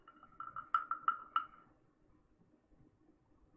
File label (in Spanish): ASMR Sonidos Tibetanos